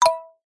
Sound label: multimedia alert chime wooden mallet style; zapsplat